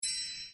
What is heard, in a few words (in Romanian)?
cadou; santas gift jump